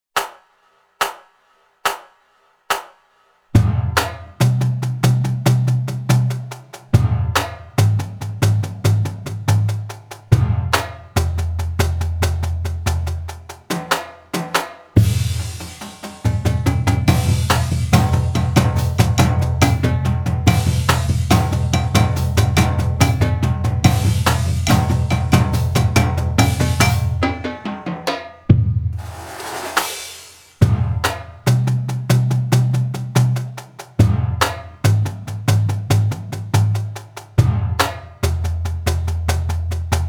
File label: junior drumline